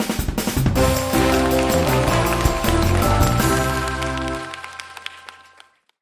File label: game show intro